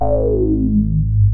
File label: EMU MOD, modular syn; Sonic Reality; Vintage Timetraveler